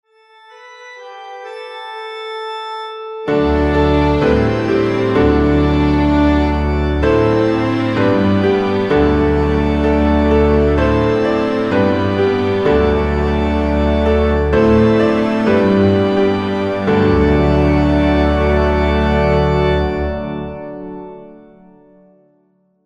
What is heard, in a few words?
Memorial Acclamation Christ Has Died instrumental